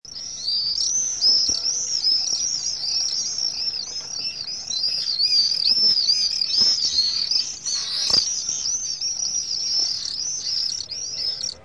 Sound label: duett